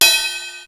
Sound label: Ride, Roland TR-626; Drum Samples